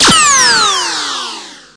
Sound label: Electro FX; Ueb HE Electro FX